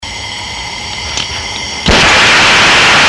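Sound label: blow torch, tools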